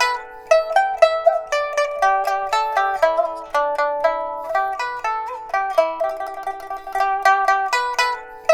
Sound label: PIPA, 90; INIS - Opium